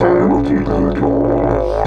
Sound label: 130, Didgeridoo; Big Fish Audio - Didgeridoo and Other Primitive Instruments; 130BPM